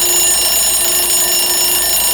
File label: alarm clock; SoundsCrate